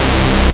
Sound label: Bass C, Offbeat Bass